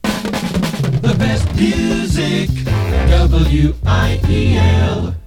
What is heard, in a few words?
jingle